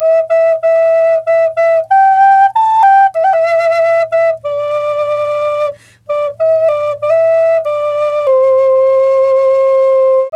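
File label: flute, 93 BPM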